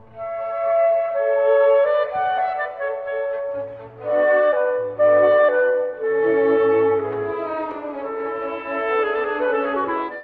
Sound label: Clarinet Concert Bb; Mozart